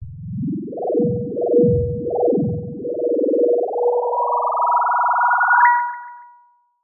synth space weird synthy sci fi power up metal ring